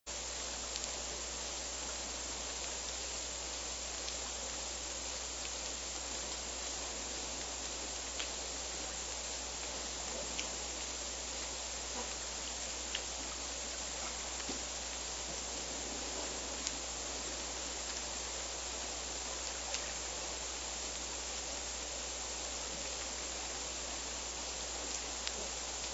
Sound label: groan